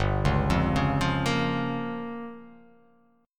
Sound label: G Minor 7th Flat 5th